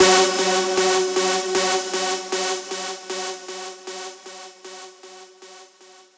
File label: synth screech oneshot F#; Synth Hits; GhostHack